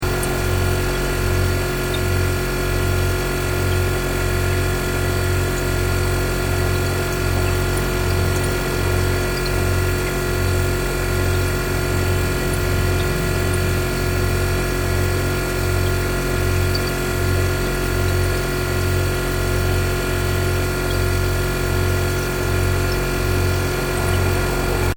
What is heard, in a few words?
FRIDGE COMPRESSOR, Kitchen refigerator; Kitchen Sound Effects; Kitchen (Sound Effects:Household)